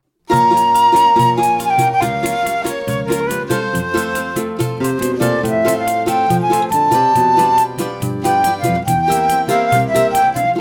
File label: songbook instrumental